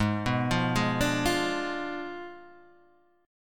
G sharp Diminished 7th G sharp, Guitar Half Step Down; G#dim7